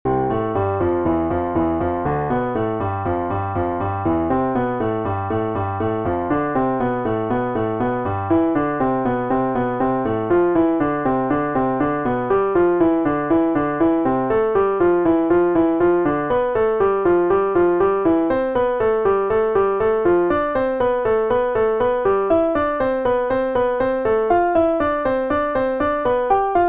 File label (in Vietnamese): bai tap luyen ngon piano